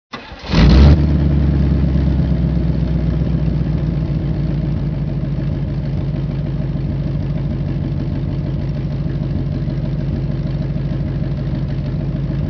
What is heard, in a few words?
car start